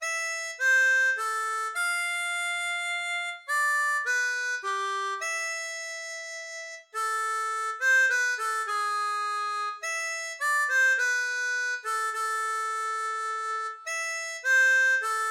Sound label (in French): Menuet La Mineur, harmonica; Krieger